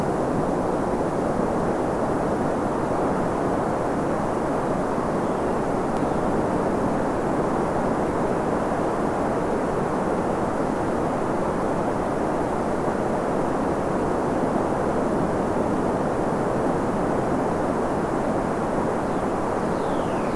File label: WFR audio emfisis